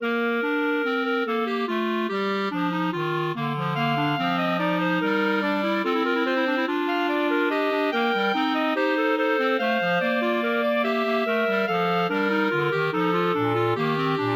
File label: sinfonia for clarinet trio; Johann Sebastian Bach